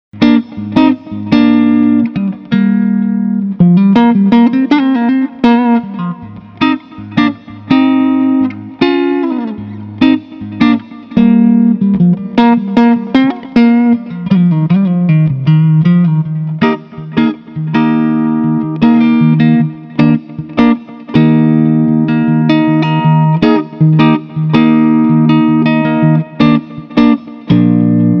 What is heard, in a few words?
54 strat neck clean, pickups